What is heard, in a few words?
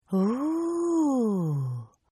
Oooh Female; Gasping; Voice